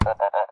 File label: jack o lantern hit